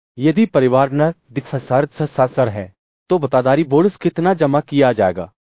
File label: techno